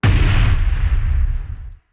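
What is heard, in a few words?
nuke explosion; guns n zombies 2014 pc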